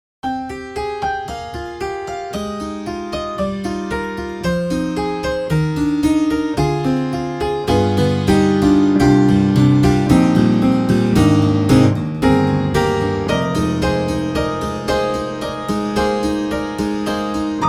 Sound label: Tack Piano Mono; Spectrasonics; Keyscape; Wing